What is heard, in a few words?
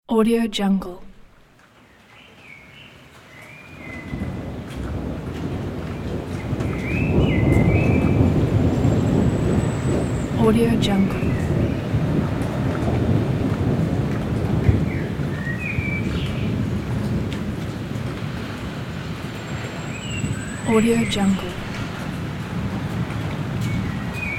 rain and thunder